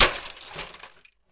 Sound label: Electronic Device; electronics